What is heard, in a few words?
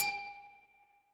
glass clink